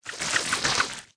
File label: Cream